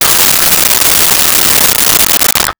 Circular Saw

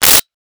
Gadget, Mechanical

